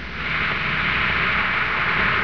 drink fizz; There; ThereClient

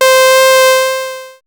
C4, Synths; Multisamples; Ambient Encounters; Ableton Live 12 Lite; Core Library

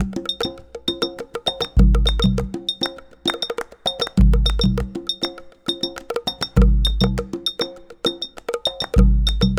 Semba Percussion 100 bpm; Loops; Drums; Ableton Live 12 Lite; Core Library

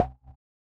Wood Block; One Shots; Drums

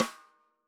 Snare Rim, drums; One Shots; Plymouth

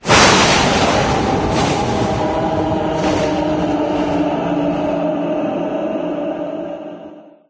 ice loop; There; ThereClient

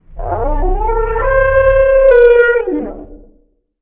prisoner elegy beat; OuterWildsModManager; OWML; AnonymousStrangerOW.TheStrangerTheyAre

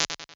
Early Reflections Comb Space, Impulse Responses; Ableton Live 12 Lite; Hybrid

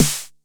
Snare 909 Hard

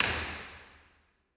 Real Places Foyer R, Impulse Responses; Ableton Live 12 Lite; Hybrid

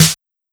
Snare Loose Boom

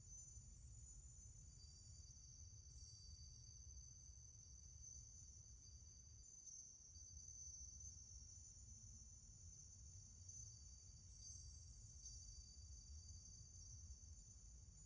Floating Symbol Ambience; Journey

